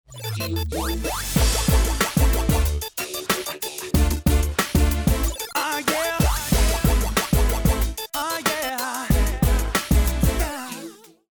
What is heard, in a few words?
start action; music kits custom